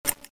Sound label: SNIP